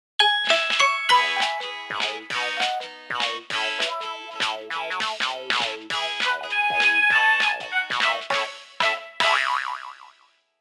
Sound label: Radio Jingle (Easter); Animal Crossing New Horizons